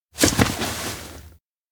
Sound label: nuzzle; baron